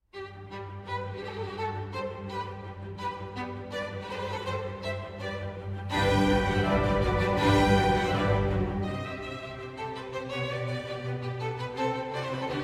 Violin Concerto in G Major, Allegro

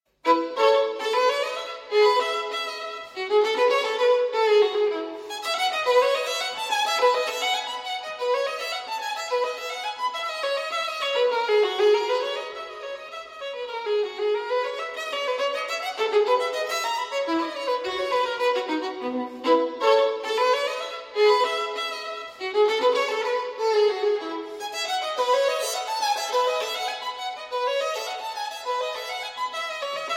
Violin Partita in E Major Bourrée; No. 3; BWV 1006 VI.

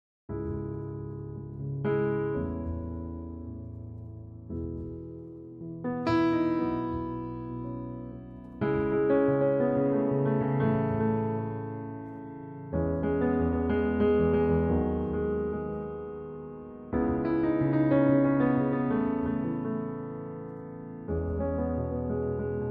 Piano Version

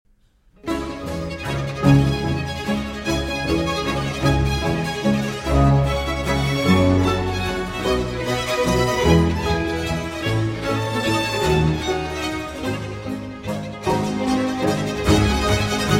Cello Concerto in D Minor, Allegro; RV 405

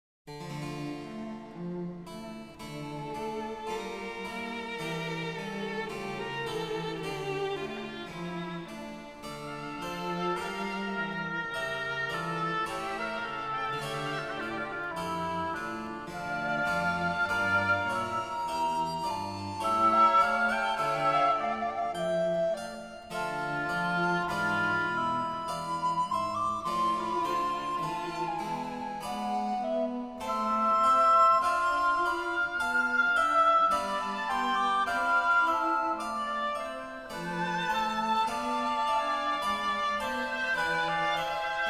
Concerto in F Major, Andante; Bach Brandenburg Concerto No. 2; BWV 1047 II.

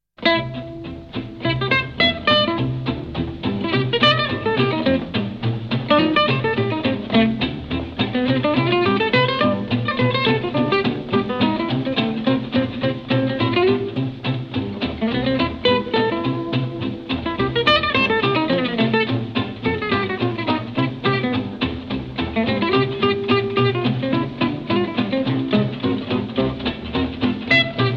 Blues

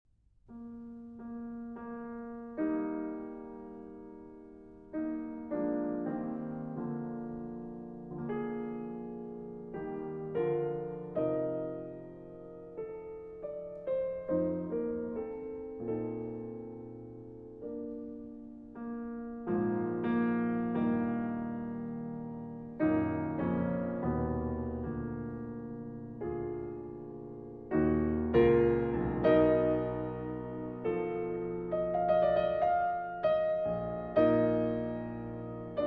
Sonata in G Minor, Adagio

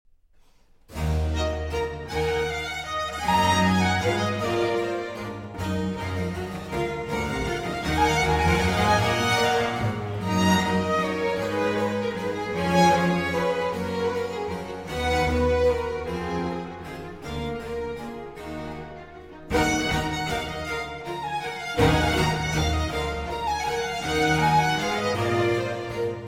Violin, Strings; Concerto E Minor; III. Allegro

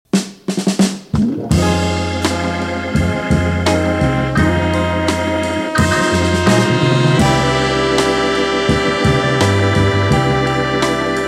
(Instrumental)

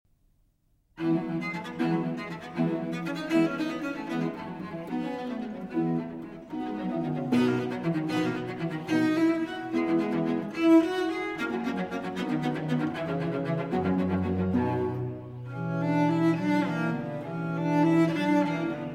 Sonata For Cello and Continuo in F Major; Vivaldi; R.41

